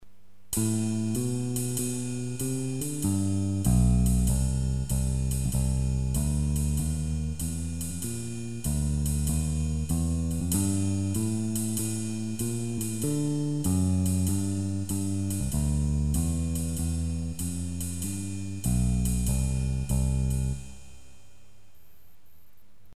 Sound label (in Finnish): kassaatsa symbal walking bass